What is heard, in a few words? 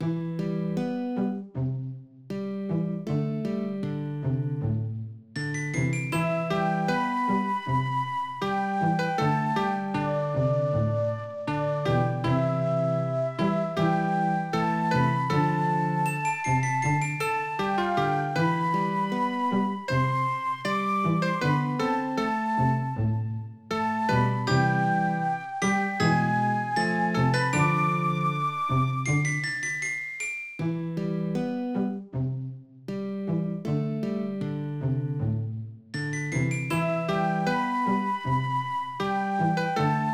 Music Sad; Tales to Enjoy Puss in Boots; NDS